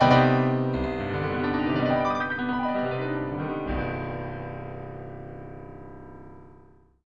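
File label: Piano (stereo), 100 milliseconds delay, 80 %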